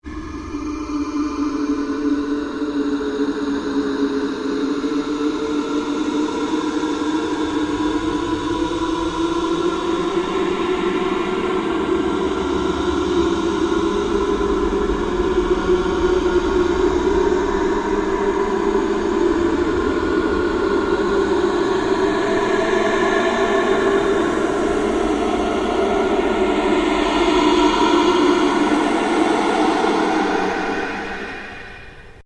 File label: Ambient Drone No Sweep; Sound effects created by manipulating other sounds; Drones (Sound Effects:Sound Design)